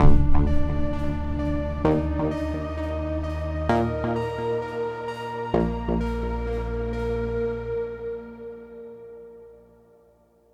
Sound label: Stinger; Portal Gun; Portal Revolution Extras